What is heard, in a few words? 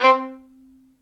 Violin Solo Staccato; Ableton; Orchestral Strings